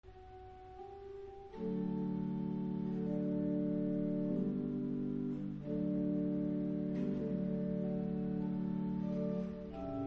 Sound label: Hymn; Berwald